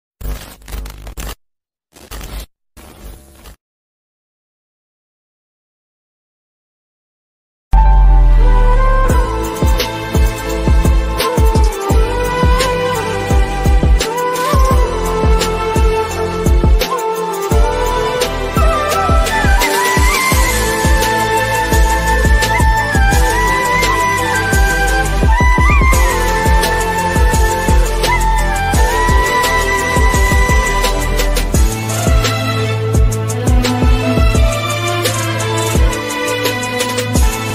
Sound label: movie ringtone or bgm ringtone